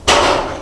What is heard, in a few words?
metal